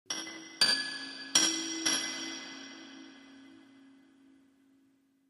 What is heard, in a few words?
Chinese Chimes Bells